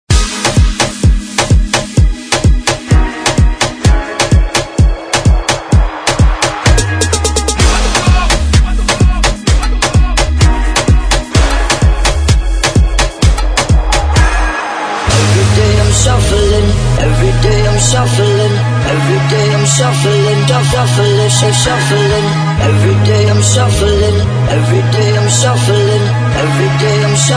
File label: Remix Hype intro